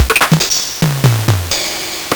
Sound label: Gameboy LSDJ Drum; Nintendo Gameboy-LSDJ